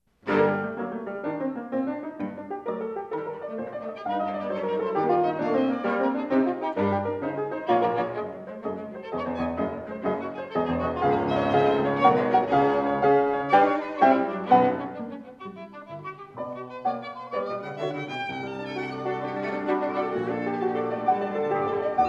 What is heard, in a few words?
Piano Trio; mvt. I